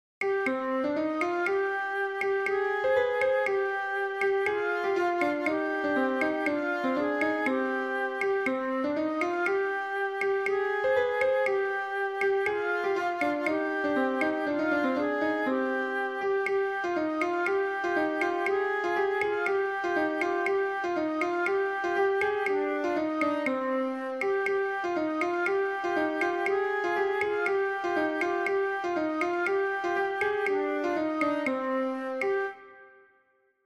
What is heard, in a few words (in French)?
bourrée tutti, vielle à roue; stage de vielle a roue